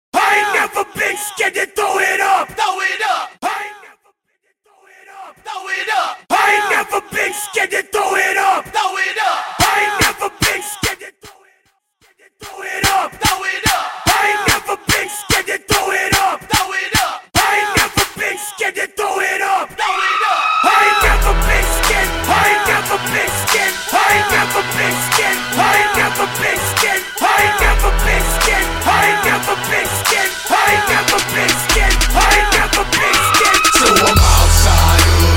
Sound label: Hip Hop Acap In Clap A Pella Into Extended Short Mix 73 Bpm